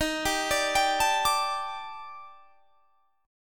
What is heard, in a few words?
D sharp Major 7th Flat 5th, down, chord; D#M7b5; arpeggiated